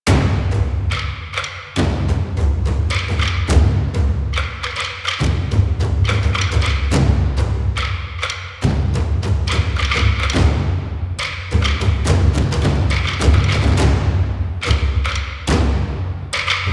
Game Waiting Loop, Music; Warframe; DE; PVP; Lunaro

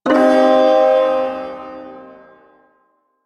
Ersatz Shawzin Blues Chord; Warframe; Duviri Ersatz Shawzin

